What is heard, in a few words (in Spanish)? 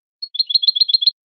campanilleo